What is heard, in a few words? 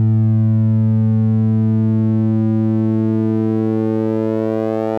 pulsars simple